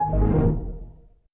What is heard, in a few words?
Windows Shutdown; Windows Never Released Soundpack 1